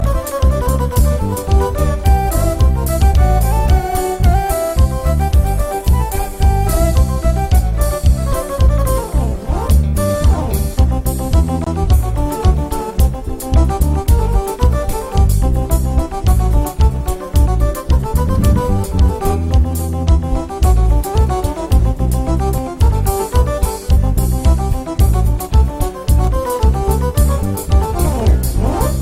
Kompa Piano Solo

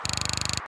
SMS

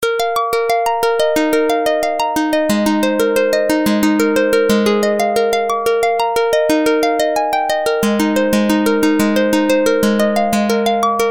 Harp

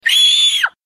Puppet shark scream

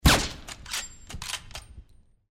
sniper; wolfenstein; meloboom

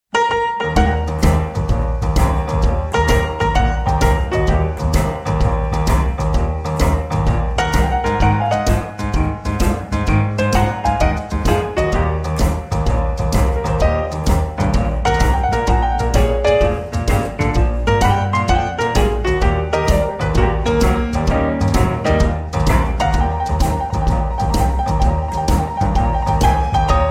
Blues piano

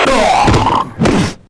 death; Visor

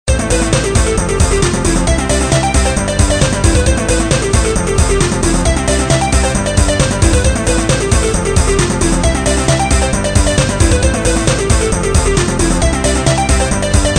Disco

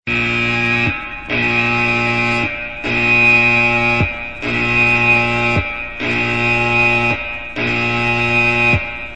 Buzzer Sms

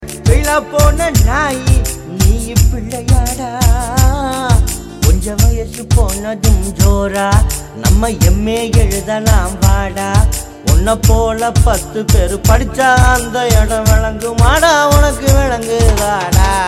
Comedy Ringtone

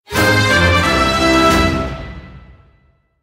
Level Up

